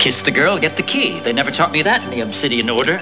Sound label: kiss girl